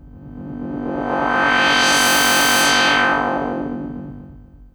Modular, sci-fi

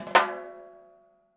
DW Green Maple Timbali; Sonic Foundry (Sony Creative Software); Vital Drums

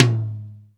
HI EDGE DRUM

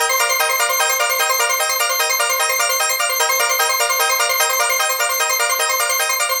OBX; OBX ORGANIX; Masterbits - Tekkno Trax; SQ LOOP 150

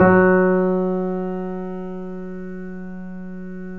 F#, The Piano; InVision Interactive - The Piano; BOESINGSOFT

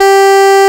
FM TENOR G4, HORNS; AKAI S-Series CD-ROM Sound Library VOL-2

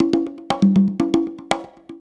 120 BONGO

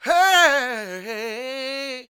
SOUL, Vocal Planet, Blues & Soul; CW SOUL

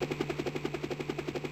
Heli Loop; Yamaha DTXpress-II

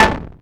SYN FX; X-Static Goldmine 1

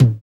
DRM OLD, dance synth

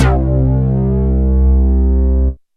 BARK BASS, OLD SYNTHS; Rubber Chicken Software Sample Bank

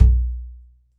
The Fat Box Low Hit, Latin Percussion; Sonic Foundry (Sony Creative Software); Joe Vitale Latin Percussion

